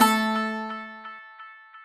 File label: baglama la; baghlama